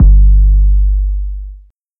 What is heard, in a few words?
Kick Long